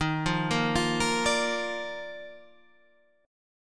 D sharp Major 7th Suspended 2nd D sharp Guitar Half Step Down, chord